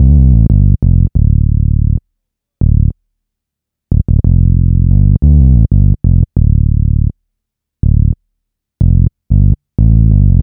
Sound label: Bass, Hip Hop, 092, Dmin